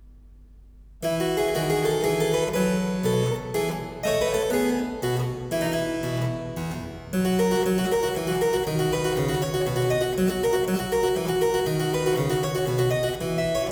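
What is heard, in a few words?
Menuet in F major, Keyboard Works; kv5; Mozart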